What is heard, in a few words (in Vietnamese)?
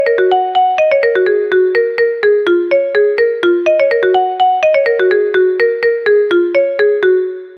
khong loi poco f2 pro xiaomi smartness